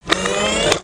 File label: BroomBot servo; creatures mechanical